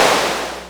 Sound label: Clapbox Noise; Ableton Foundation FreePacks